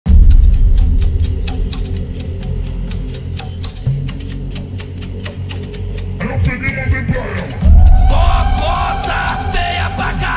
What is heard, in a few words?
Brazilian Phonk Bass Boosted